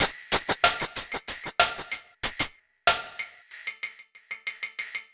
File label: Metallic Beat, Electronic Beats; Sonic Foundry (Sony Creative Software); Myths of Technology